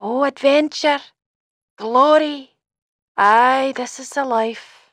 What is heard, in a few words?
haha; NWNDS; Annah